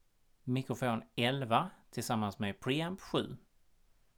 mic pre

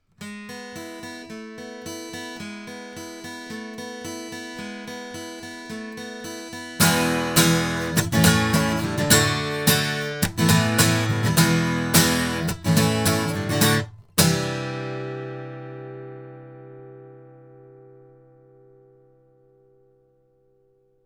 guitar

